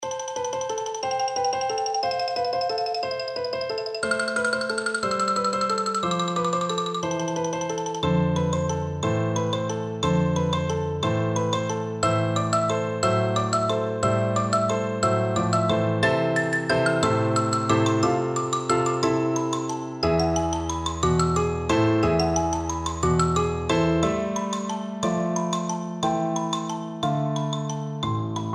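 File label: xylophone, percussion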